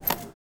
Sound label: domestic